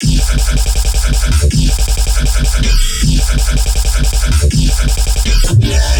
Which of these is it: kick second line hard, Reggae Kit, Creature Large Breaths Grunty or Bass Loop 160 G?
Bass Loop 160 G